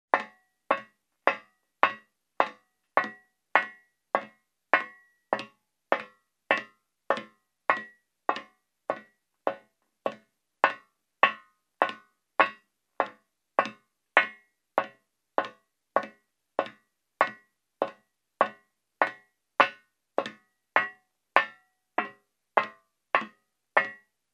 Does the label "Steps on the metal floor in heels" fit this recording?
Yes